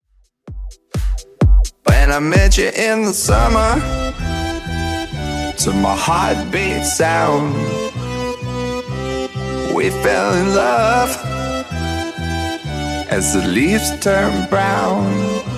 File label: 2ks Redrum 128 bpm